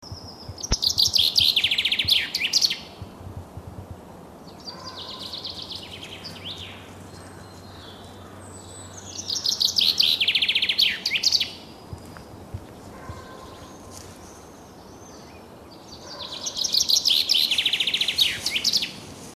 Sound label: fringilla coelebs, vogel; hecke; biologie